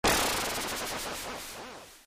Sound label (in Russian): Zvuk dla montazhnogo perehoda fyrkauchiy